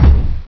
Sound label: MENU MOV; CHASM